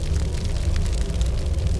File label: fire garbage can